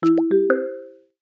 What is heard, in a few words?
incoming Call